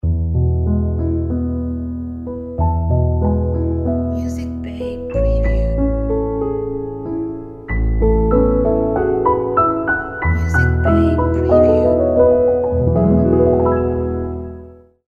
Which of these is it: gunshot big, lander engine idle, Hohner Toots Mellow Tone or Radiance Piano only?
Radiance Piano only